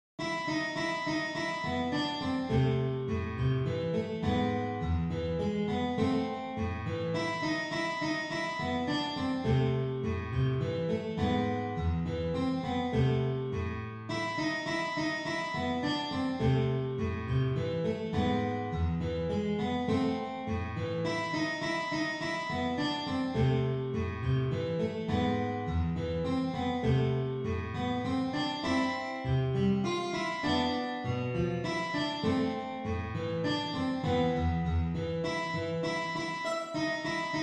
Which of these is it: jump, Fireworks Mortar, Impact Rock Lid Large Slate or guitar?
guitar